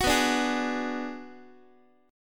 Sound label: B-Minor 6th B down Guitar; Bm6 strummed; x,x,9,9,6,7; JGuitar